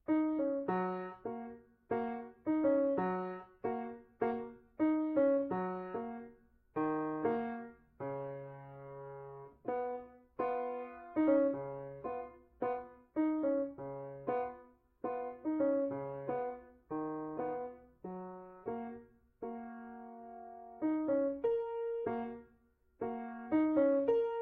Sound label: Piano Solo